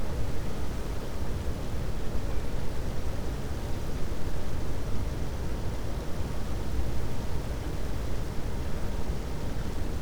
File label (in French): cachalot negatif, Physeter Macrocephalus